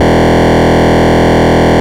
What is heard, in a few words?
Pitfall E0, Glitch, LoFi; Ableton Foundation FreePacks; Foundation Glitch Toys & LoFi